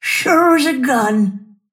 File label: move pain; snapfire